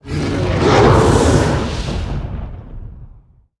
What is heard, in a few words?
Wailing Vapor Attack